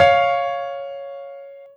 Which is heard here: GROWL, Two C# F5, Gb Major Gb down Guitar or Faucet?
Two C# F5